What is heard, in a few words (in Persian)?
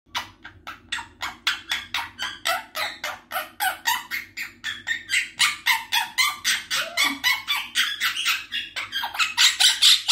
صدای طوطی راهب